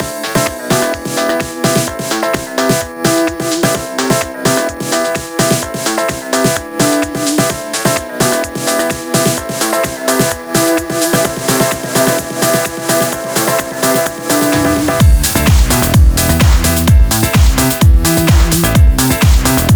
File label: 128BPM Mix; Vengeance Samples; Doubleride Kit